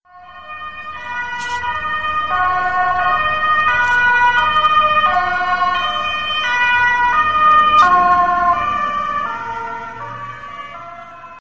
dutch ambulance; ringtone

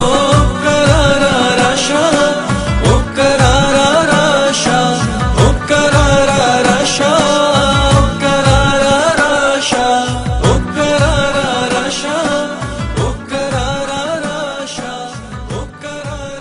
pashto ringtone

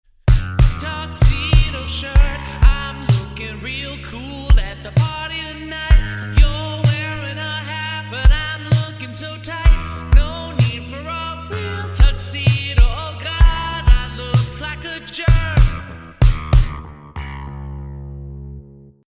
ringtone

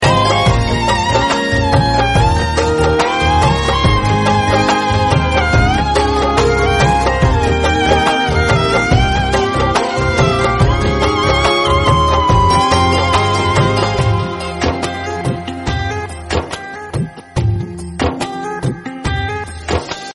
violin ringtone

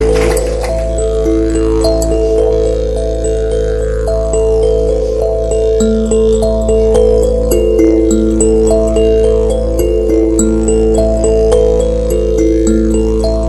folk lore ringtone

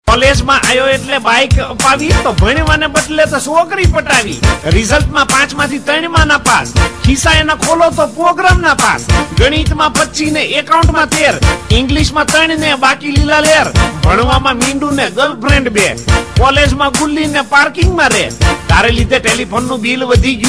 funny gujarati rap; ringtone

